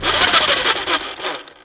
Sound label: Synth Perc; Dream Zone